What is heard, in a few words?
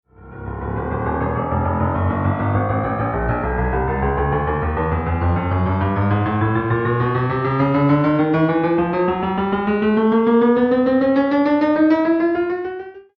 Quarter tone chromatic run; ragajunglism